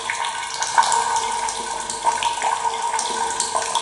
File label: water drops